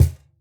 Cajon hit f; Idiophones; Struck Idiophones

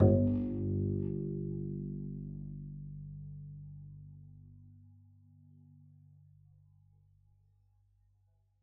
pizz g1, double bass; dsmolken

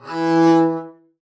arco e3 ff up, double bass; dsmolken

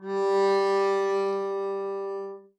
arco g3 f up, double bass; dsmolken

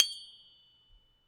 G1 loud, Tubular Glockenspiel; Idiophones; Struck Idiophones

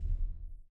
Harpsi High Rel Far E2, Harpsichord, Flemish; Chordophones; Releases

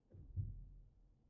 Player Ped Off, Upright Piano, Pedal; Chordophones; Upright Piano, Knight

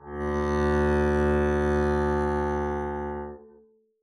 arco d2 mf down, double bass; dsmolken

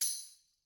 Tambourine Hit Mid; Idiophones; Struck Idiophones; Tambourine 1

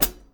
Hat Pedal Hardest; hydrogen drum samples

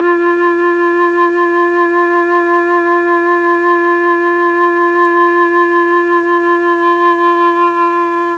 F2 flute, mellotron

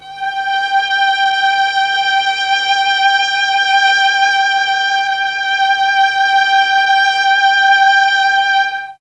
G5 300 viola, mellotron, strings

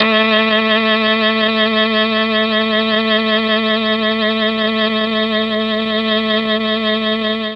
A2 MKII sax, mellotron

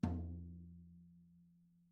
tenor mf, Toms; Membranophones; Struck Membranophones; Legacy Toms; tenor lower

